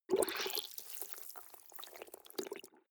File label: clothes water wring soft, haushalt; haus garten